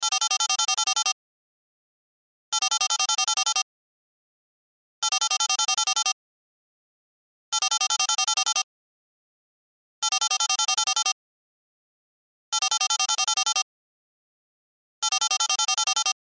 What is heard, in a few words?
Cell Ringtone; Loop; Technology; Cell Phones; Electronics